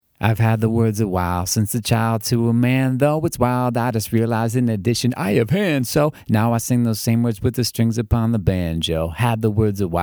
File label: banjo